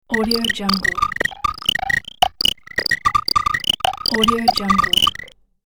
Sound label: alien insect droid inner workings; sonic-boom